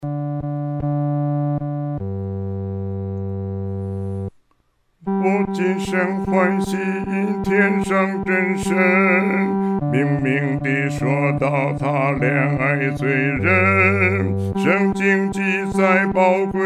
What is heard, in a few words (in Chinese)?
独唱 第四声